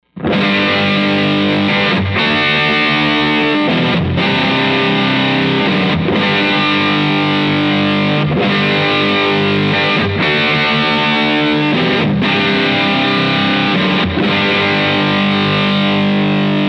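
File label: prs bridge dirt rhythm; prs22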